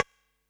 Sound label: Perc, Percussion and FX; Pearl SC-40